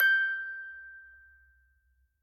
orgel

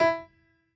piano hi

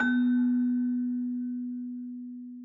Celesta Lower B

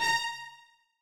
violoncello

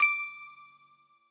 bell D7

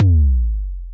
TOM

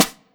normal hit clap

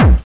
normal hit clap

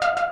synth; osu

